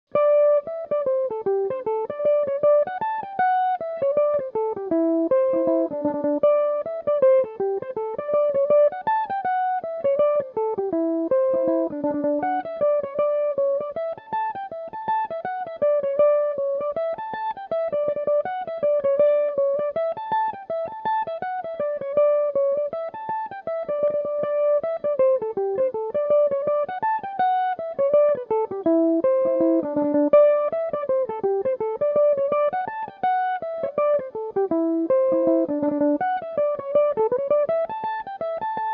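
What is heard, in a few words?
reel D mixolydian, irish mandolin, tunes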